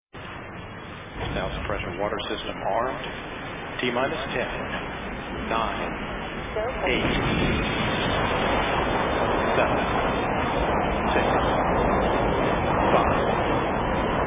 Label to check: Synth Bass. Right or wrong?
Wrong